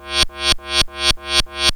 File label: Bleepz, sci-fi